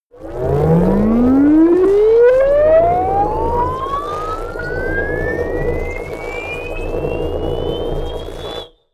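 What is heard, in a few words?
wobble glitch riser long, science fiction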